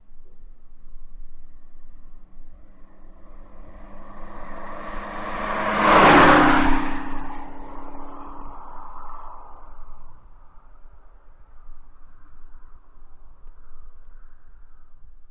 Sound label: Mustang Fast By Highway, Long Head and Tails